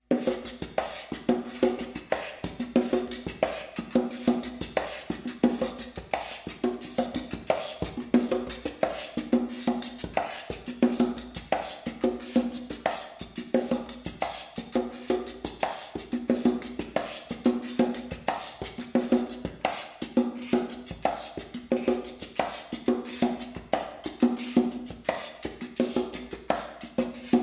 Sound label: Percussion Plate